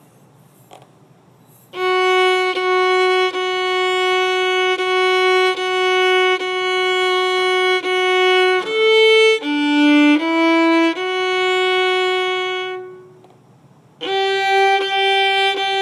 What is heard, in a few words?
Jingle Bells